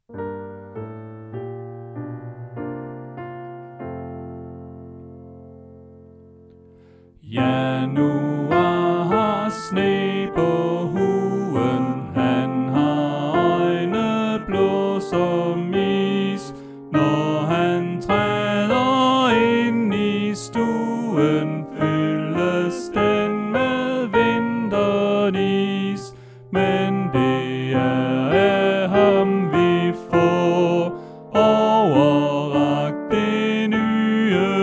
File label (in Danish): Sopran